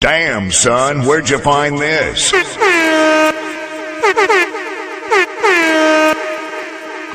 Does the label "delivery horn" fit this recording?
Yes